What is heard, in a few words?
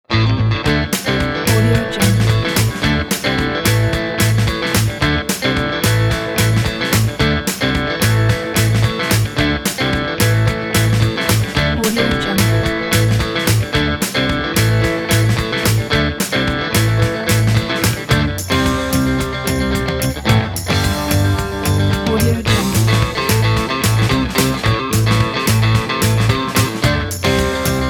rock the country